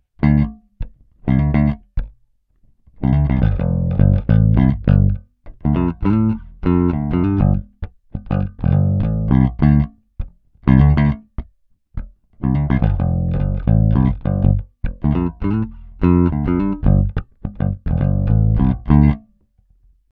Peavey Stock Bridge Pick Tone UP; peavey shootout audio samples

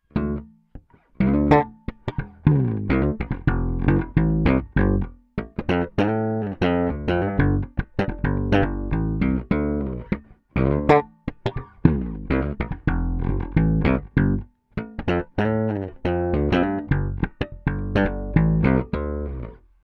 Humbucker Bridge Slap Tone UP; peavey shootout audio samples

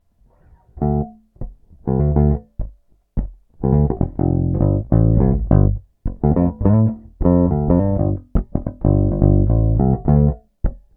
Super Neo Neck Pick Tone DOWN, peavey; Peavey based pickup Shootout

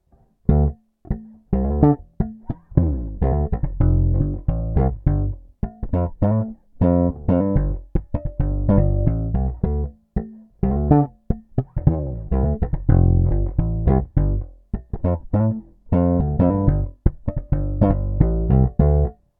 Neck Slap Tone DOWN; Peavey based pickup Shootout; Super Neo

